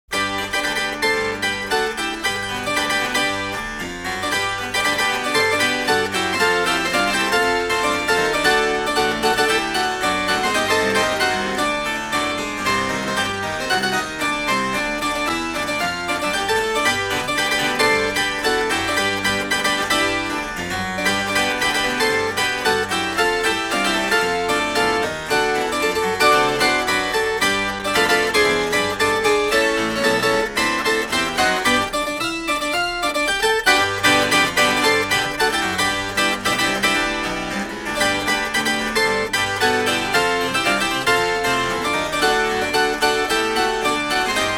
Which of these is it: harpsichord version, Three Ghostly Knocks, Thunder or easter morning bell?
harpsichord version